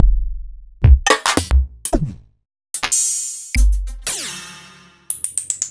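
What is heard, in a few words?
Roland MV8800 drum glitch kit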